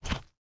solid fuel inventory pickup; factorio 2.0.55

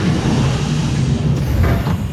centrifuge; factorio 2.0.55

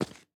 refined concrete, walking; factorio 2.0.55

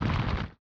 drill inventory move; factorio 2.0.55

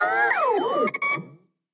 flying robot; factorio 2.0.55

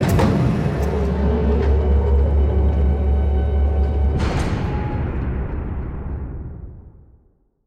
silo doors; factorio 2.0.55

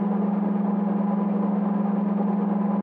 express transport belt; factorio 2.0.55

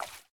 small splash; factorio 2.0.55

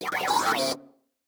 construction robot; factorio 2.0.55

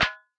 fuel cell inventory move; factorio 2.0.55

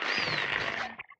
robot repair; factorio 2.0.55

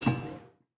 metal small inventory move; factorio 2.0.55

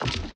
armor open; factorio 2.0.55

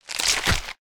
medium gore; factorio 2.0.55

